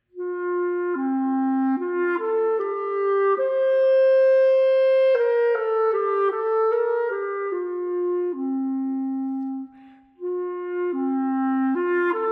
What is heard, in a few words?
Clarinet Etude